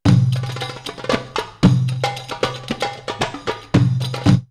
Desert Beat 114, drumloops World Ethnic; X-Static Goldmine

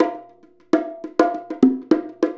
Bongo; Drums; Bongos; Essential Percussion

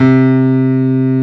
PIANO C2; AKAI S-Series CD-ROM Sound Library VOL-2

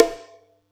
Yamaha EX5, Drums

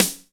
MIX SD; AKAI S6000 CD-ROM - Volume 1

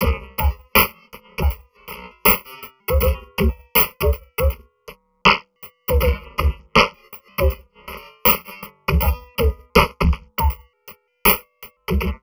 Drum Loops Crazy Processed

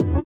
sitar, Indiatek; Spectrasonics; Metamorphosis

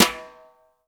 sfx perc

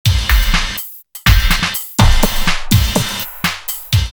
drumloops Techno House 124; X-Static Goldmine

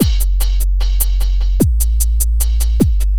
Beat, Drums & Percussion; Electrocution; Beats

